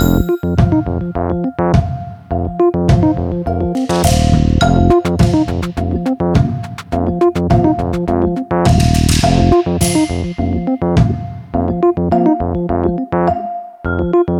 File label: Shot, ringtones; Yota; Yotaphone 2